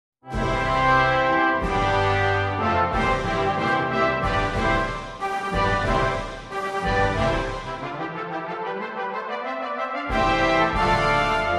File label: Festival Coronation March, Brassband